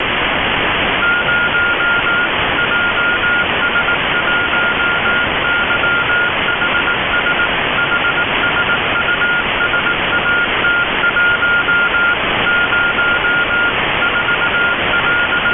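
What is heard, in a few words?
CW beacon, spacesounds; HSU-SAT1; 437,280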